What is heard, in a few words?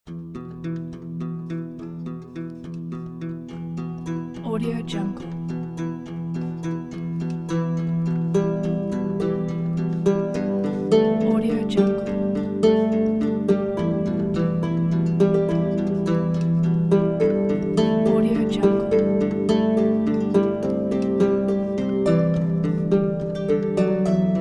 celtic heart